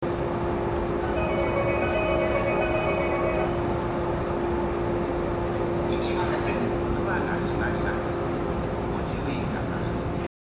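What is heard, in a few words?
arakawaoki, departure bell; zyoban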